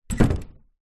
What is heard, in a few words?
household door close internal wood modern; zapsplat